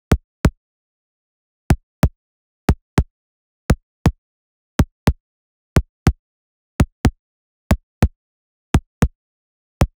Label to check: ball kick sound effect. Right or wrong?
Right